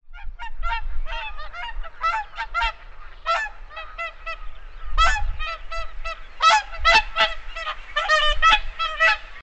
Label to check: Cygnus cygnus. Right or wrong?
Right